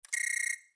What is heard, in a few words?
Beep; Star Trek